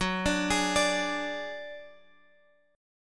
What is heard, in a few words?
F sharp Suspended 2nd Sharp 5th, Guitar, Open F; arpeggiated; 18,21,20,21,x,x